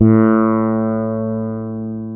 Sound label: FRTLS A2H, FRETLESS; InVision Interactive; Lightware VOL-1; Instruments & Percussions